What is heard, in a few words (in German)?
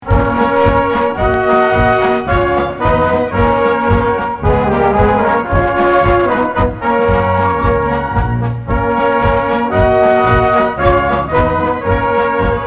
Blasmusik